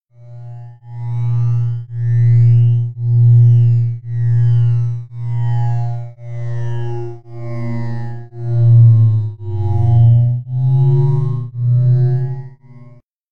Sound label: psy r, ambient